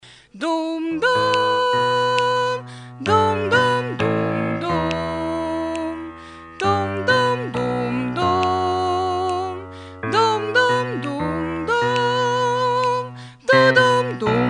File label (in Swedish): bas, stammor